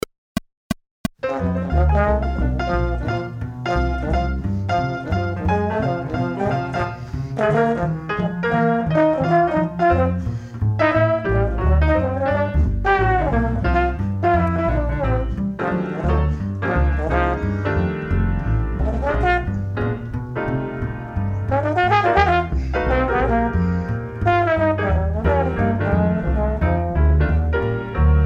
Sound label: Blues In F 176 No Drums